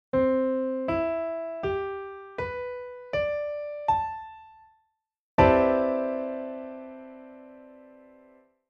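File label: chord piano maj7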